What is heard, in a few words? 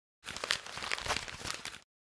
Sheet of paper crumpled